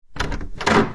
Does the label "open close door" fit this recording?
Yes